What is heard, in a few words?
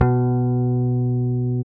Basses, Roland